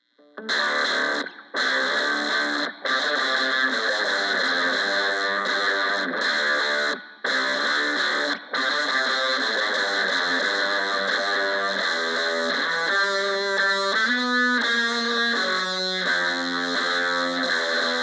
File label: low gain, fuzz; lee cosmic level fuzz; marco dodin